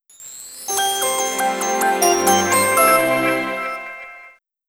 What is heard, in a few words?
startup